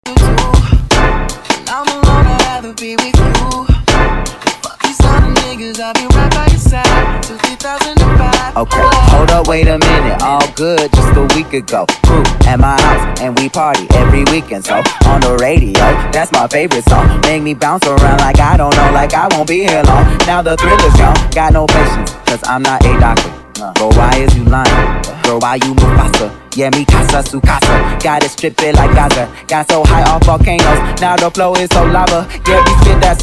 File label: Classic Hip Hop Party Mash Up